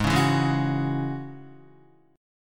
Ab 7th Suspended 4th Sharp 5th Ab down Guitar, Chord; Ab7sus4#5 strummed